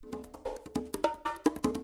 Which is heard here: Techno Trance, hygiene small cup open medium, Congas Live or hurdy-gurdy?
Congas Live